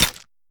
mistake

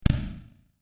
ball simple, shot

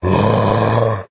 zombie

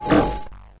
plasma shield

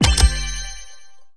golden wheel spin button; City Verse Tycoon

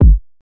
arp

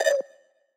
Homing Missile Targeting

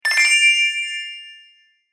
notification

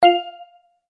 success

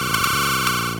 car start

